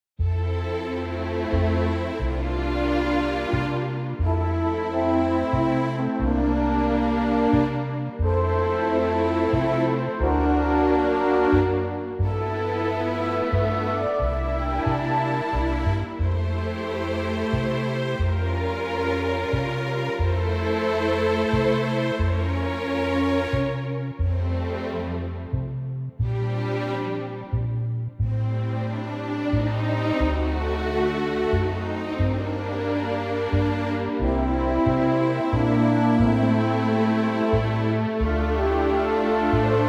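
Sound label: F orchestral; key - F - vocal range - C to E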